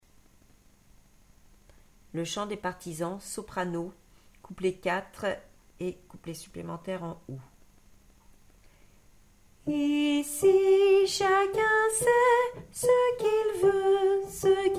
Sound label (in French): soprano